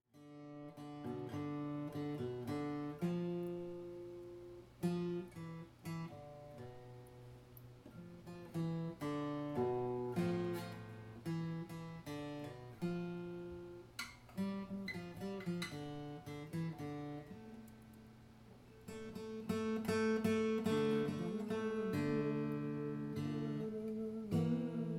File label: MUSIC IMPROVISATION EXCERPT ONE; 1m02s